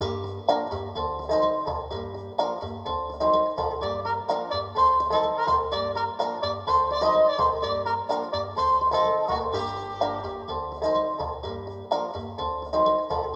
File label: Marina Music; Hatred